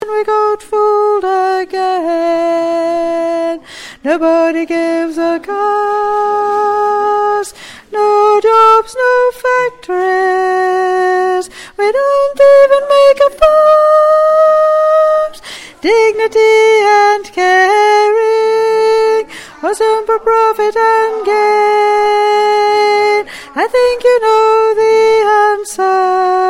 tenor chorus

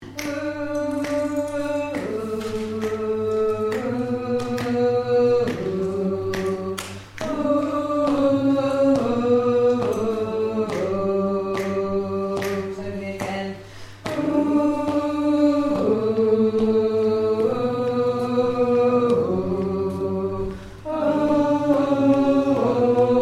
Alto

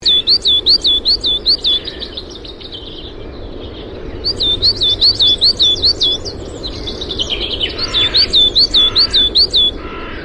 coal tit, birdsong

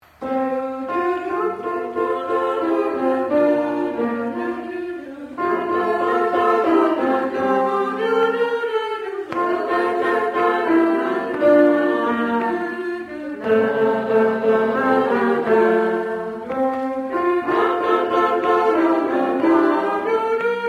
Alto Tune

